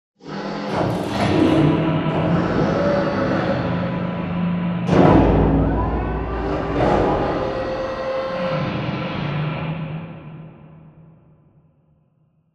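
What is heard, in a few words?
Assembly Wall Arm Distant; Titanfall 2; Boomtown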